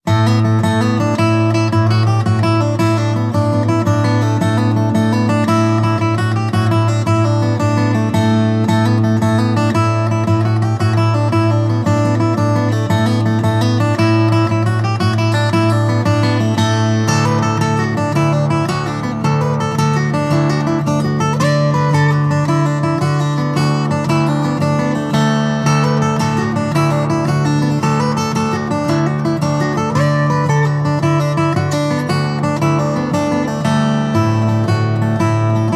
Celtic Guitar; Guitar Techniques 2019 Issue 296 June

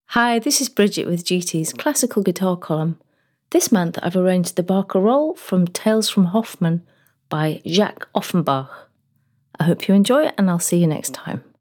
Classical intro, Guitar; Guitar Techniques 2019 Issue 290 January

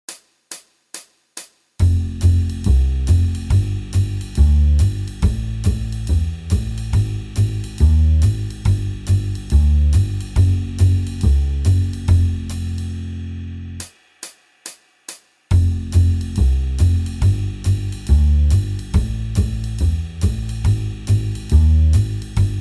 Jazz backing; Guitar Techniques 2019 Issue 296 June

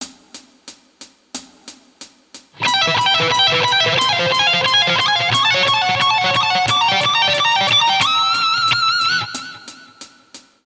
Lickbag, Guitar; Guitar Techniques 2018 Issue 289 December

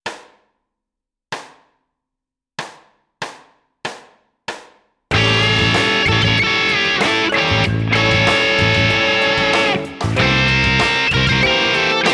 Creative Rock; Guitar Techniques 2019 Issue 294 Spring

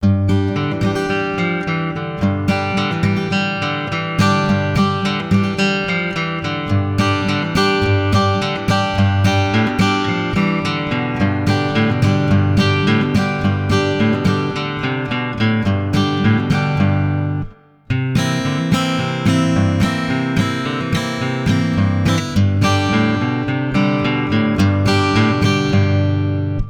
Acoustic; Guitar Techniques 2019 Issue 290 January

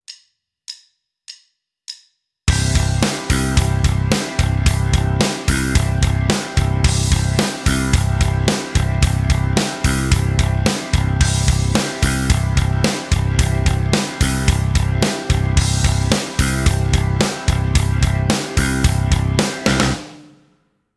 backing; Rockschool; Guitar Techniques 2019 Issue 297 July

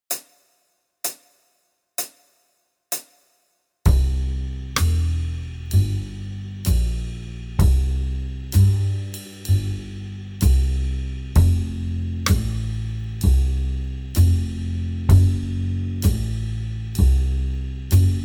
Jazz backing

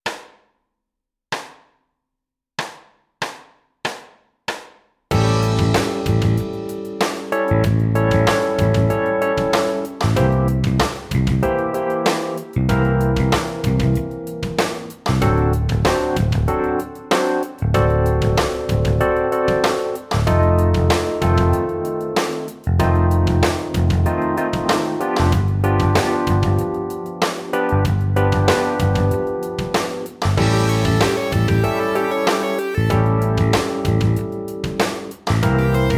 Creative Rock backing; Guitar Techniques 2019 Issue 294 Spring

